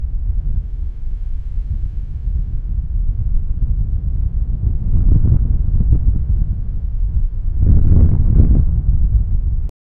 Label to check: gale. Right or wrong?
Right